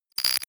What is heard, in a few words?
glitch click; zaps-buzzes